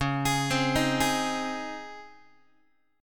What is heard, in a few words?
C sharp Major 7th Suspended 2nd, Guitar; C#M7sus2 arpeggiated; x,9,11,10,7,7; JGuitar